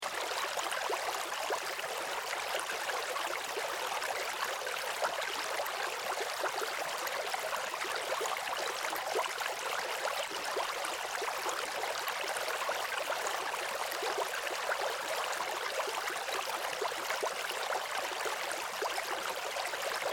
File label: Ambience Creek Large Babbling Brook Loop; Rivers; Nature; SFX Bible